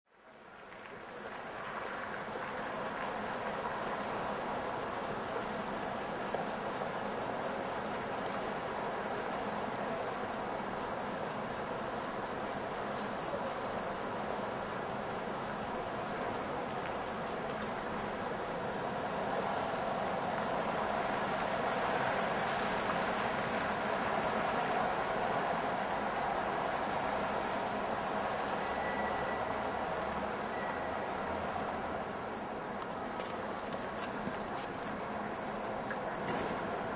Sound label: City Ambience Light City Traffic At Intersection; City ambience: Light traffic at intersection. Few cars and buses drive by, occasional footsteps